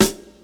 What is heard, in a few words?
crisp acoustic snare g key